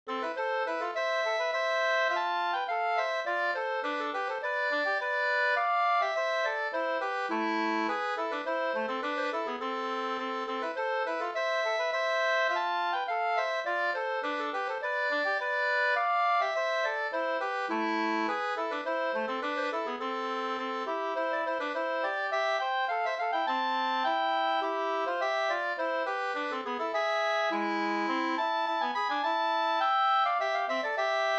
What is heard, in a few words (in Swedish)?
polska Brudpolska